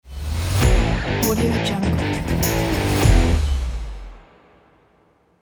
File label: rock logo; abramsound